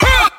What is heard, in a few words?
"huh", voice; ooh ahh